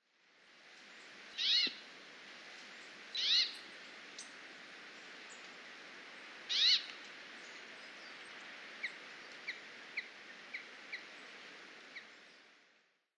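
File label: Brambling; atori; chichibu; koe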